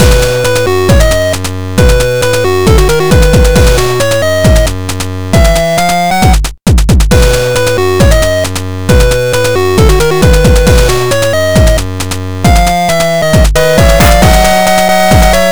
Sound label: song